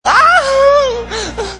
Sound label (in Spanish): ahhh tonos